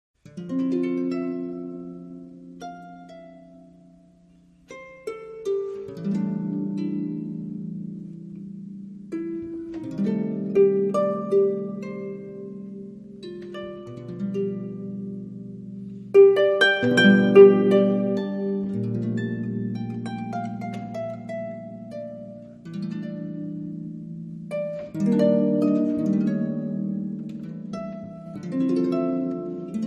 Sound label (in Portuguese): harpa bossa